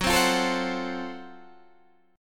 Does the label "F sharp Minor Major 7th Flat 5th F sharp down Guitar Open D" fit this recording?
Yes